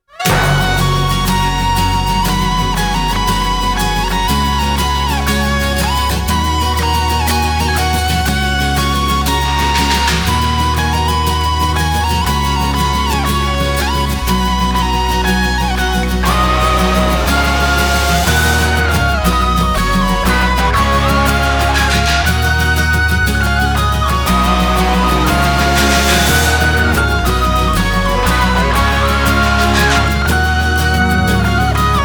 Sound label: 12 Strings